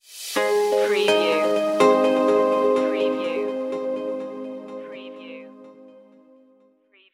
cloud environment stinger

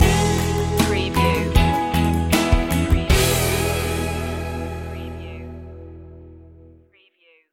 promising voyage stinger

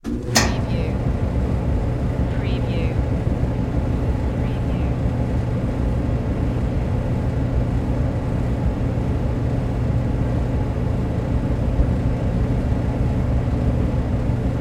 Dryer On Run Off; Sound Effects Bible

